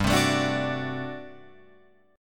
G Major 7th Suspended 2nd Suspended 4th G down Guitar Open F; strummed